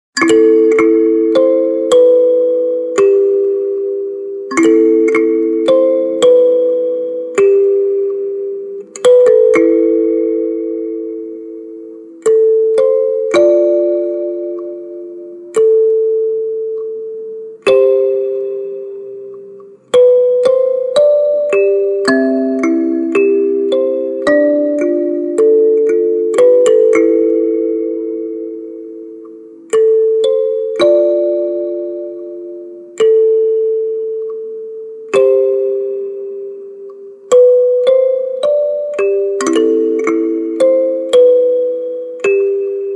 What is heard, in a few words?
kalimba cover; naruto